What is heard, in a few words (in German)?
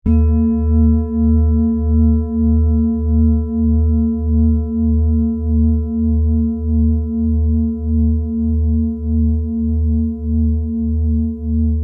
Klangmeditation